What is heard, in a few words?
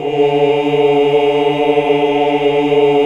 OOH. OOH UNI; Hallelujah